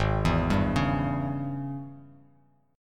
G sharp Major 7th Suspended 4th Sharp 5th G sharp, down, Bass Standard; arpeggiated; chord